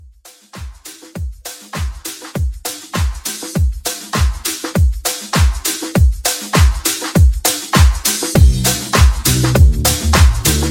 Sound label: Transition 100 124